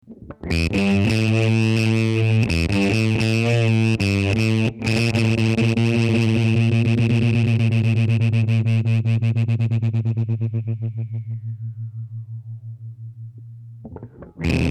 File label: nurse with fuzz